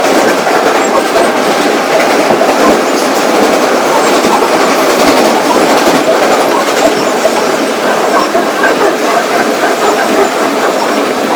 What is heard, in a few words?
RUN, Train, R62 Kawasaki; OpenBVE